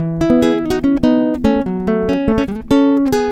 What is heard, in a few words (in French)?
arpege